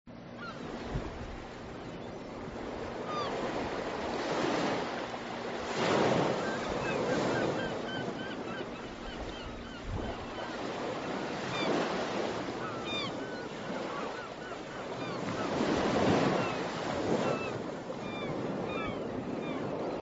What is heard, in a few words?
bg audio